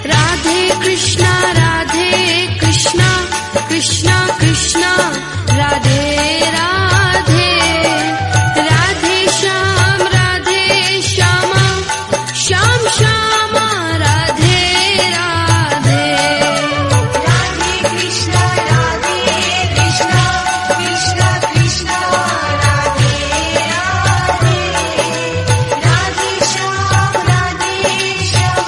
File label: Bhajan Ringtone